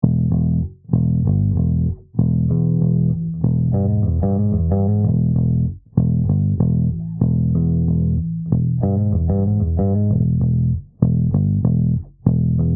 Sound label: pick groove